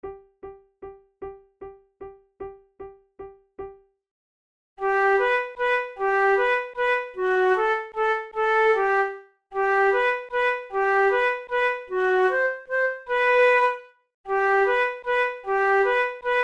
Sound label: bohemian waltz duet, flute